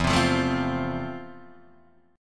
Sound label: F Major, guitar chord